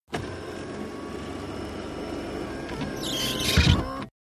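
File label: Electric Sunroof, sunroofs; accessories sunroofs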